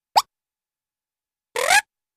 Quick High-pitched Zip & Short, Quick Kazoo-type Razz